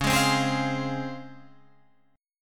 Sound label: C sharp Major 7th C sharp Guitar Drop D